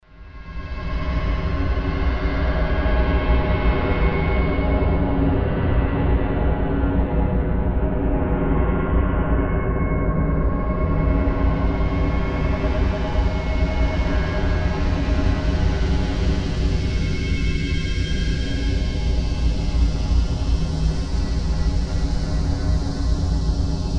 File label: plasma generator